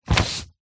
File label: paper sheet a4 pull slide out from under another; foley